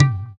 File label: EQ Perc, Percussion Mix